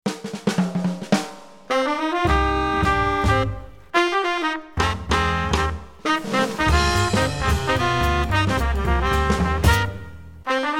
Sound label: minus Piano